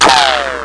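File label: cannon; IO CD 1999 April; DARKMOON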